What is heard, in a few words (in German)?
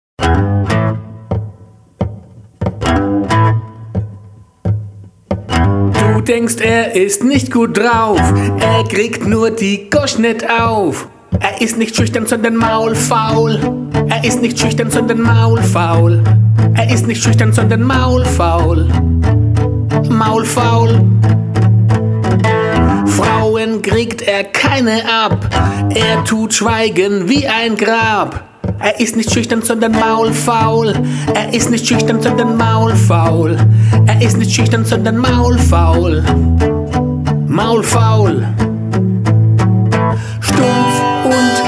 unplugged Version